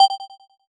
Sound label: beep; rsi